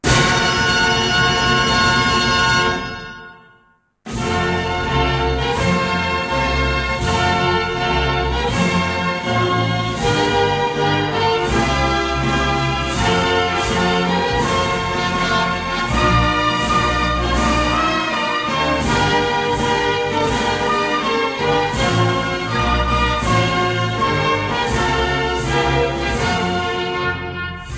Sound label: My Belarusy instrumental